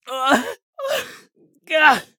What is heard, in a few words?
agony; Escape from Tarkov